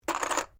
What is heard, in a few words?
COUNTERS PLASTIC GAME DROP; Casino Sound Effects